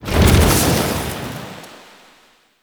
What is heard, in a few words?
Grineer Generic Teleport; Warframe